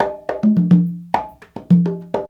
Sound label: CONGA 105